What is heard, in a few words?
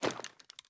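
pistol reload empty fast xmags raise; gmod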